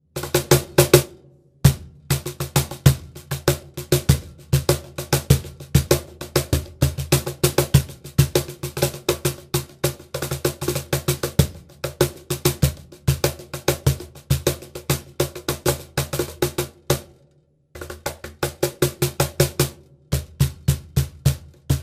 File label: club salsa cajon; Сальса; Сальса под капотом, рабочая тетрадь